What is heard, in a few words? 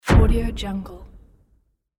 bump